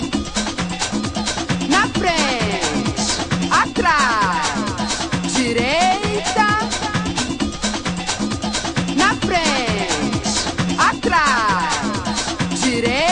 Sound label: Samba Music